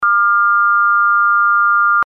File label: interval, eartraining